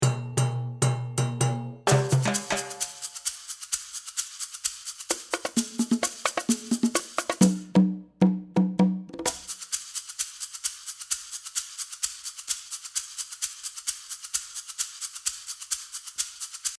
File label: percussion